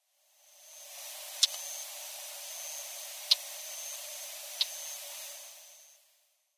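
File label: hashigurohitaki, Northern Wheatear; koe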